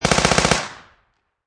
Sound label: Submachine Gun Heckler And Koch Mp5 Long Burst Close Perspective